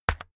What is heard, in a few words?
keyboard external key hit fast